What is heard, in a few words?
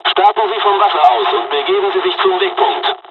hydroplane takeoff